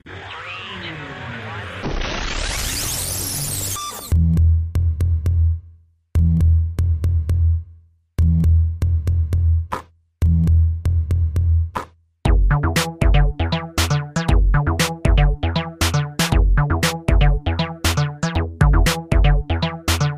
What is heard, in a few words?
cool computer geek music